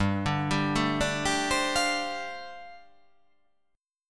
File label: G 9th Suspended 4th